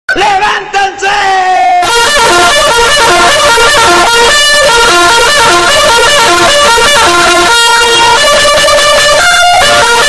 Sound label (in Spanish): levantate ruidoso